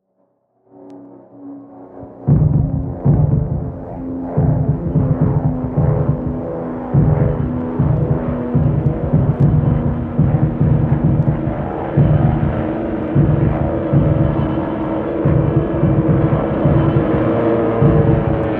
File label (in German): Luftangriff; Absturzgeräusch